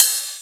Rides, Cymbals

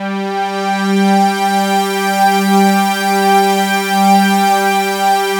analog swell; Sound Gallery - Charged

